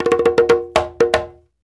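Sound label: break drum, Reggae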